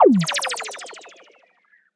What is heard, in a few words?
reels start; Back to 70s; wazdan